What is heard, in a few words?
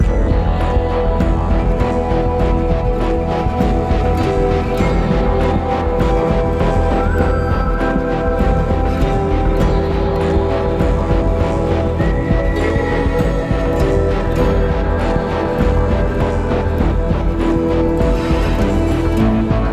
Low Threat Loop; Route99